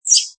Squeak, Little bird squeak, bird chirp, bird tweet; Animals